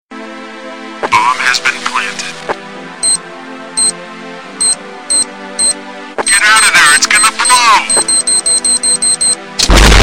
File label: tone